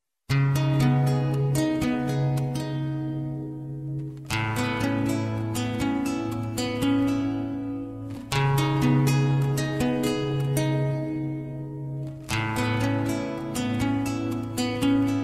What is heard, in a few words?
guitar